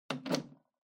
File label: Public Phone Tubular Key Insert